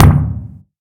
Combo kicks

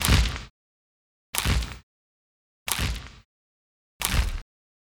Footsteps

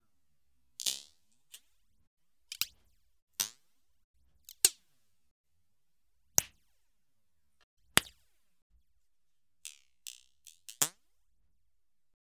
Pop Wizz And Snap Sounds

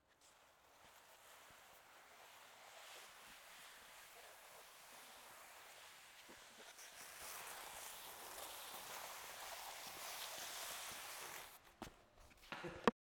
Dragging Body

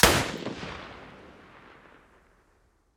Enfield rifle

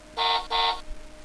Toy car horn

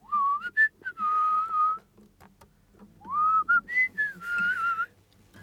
A Little Whistle

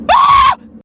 Girly Scream Longer

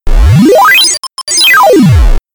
Retro Video Game Sweep

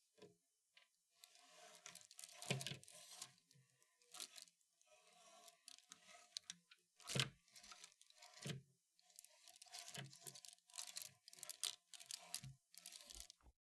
Hangers

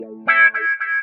Am Wah

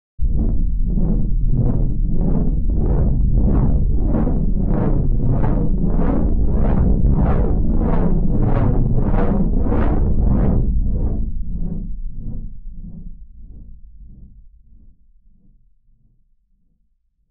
Resocopter Slow

